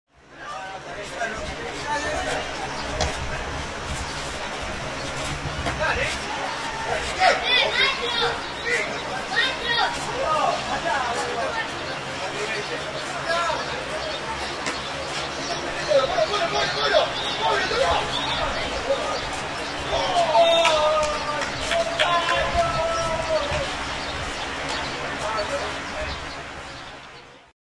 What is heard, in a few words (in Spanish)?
plazas playon adolescentes jugando futbol; buratovich